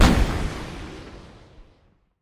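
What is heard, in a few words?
distant powerful explosion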